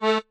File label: marimba chainsaw; ゆめ2っき